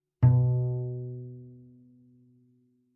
Bass pizz ff sul G B2, Double Bass; MIS Pitches - 2014; Strings

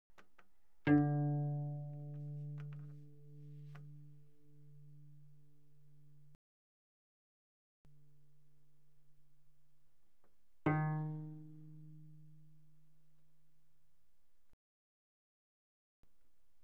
Cello pizz mf sul D D3 B3; Strings

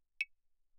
clave, hand percussion; claves

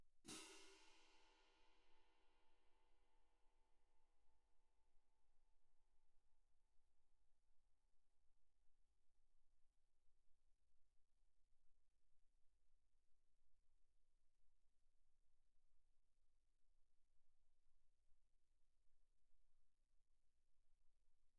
18 orch crash, french, pp, crash cymbals; Percussion

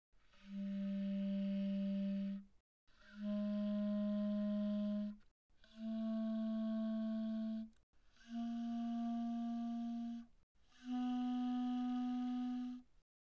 Eb Clar pp G3 B3, Eb clarinet, woodwinds

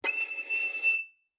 Violin arco ff sul E E7; MIS Pitches - 2014; Strings

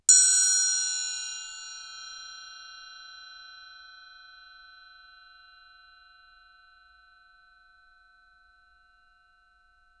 8triangle ff stereo, Triangles, Hand Percussion

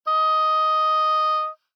Oboe ff Eb5 stereo; Woodwinds

